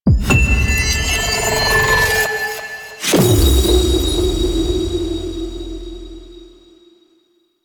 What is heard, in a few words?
Investigate Crystal; Warframe; Cephalon Weave